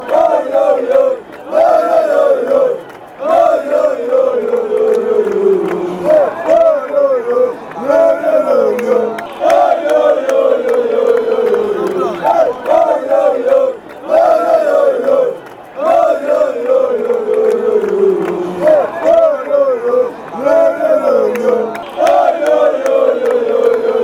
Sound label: oyoyoy fanchants